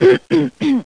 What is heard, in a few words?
THROAT; Wired for Sound Pro CD; Aristosoft, Inc.; jobsaver